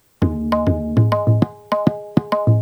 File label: Bhajani, Tabla; Tabla-Transcriber